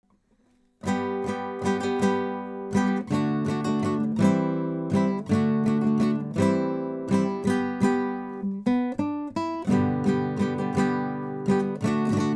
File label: Jig